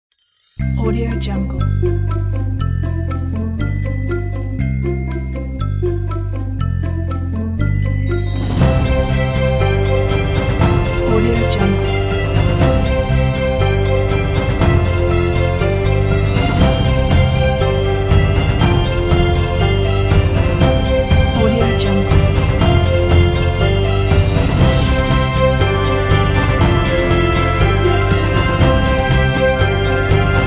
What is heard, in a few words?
christmas, آهنگ بی کلام کریسمس